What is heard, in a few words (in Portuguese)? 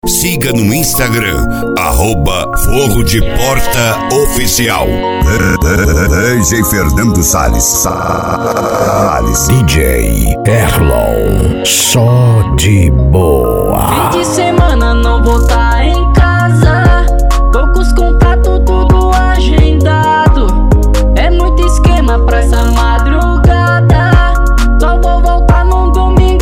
rap